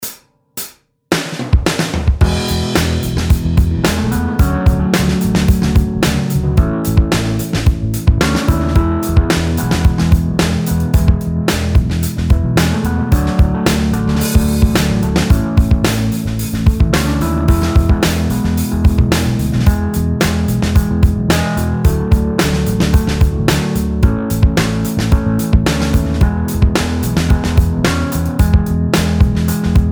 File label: slide backing